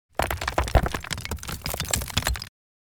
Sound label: Small rock rolling in dirt and debris